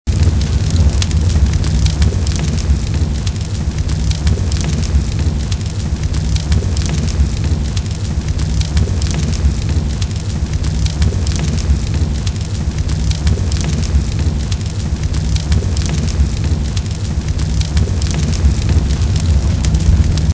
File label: molotov fire ground; reapi molotov; weapons